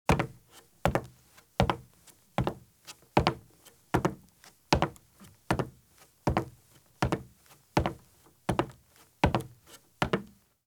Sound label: Light Running Shoes Walk at Medium Pace on Wood Porch or Footbridge; Sound Ideas; Footstep Sound Effects